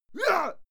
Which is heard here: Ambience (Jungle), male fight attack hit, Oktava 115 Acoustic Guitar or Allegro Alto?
male fight attack hit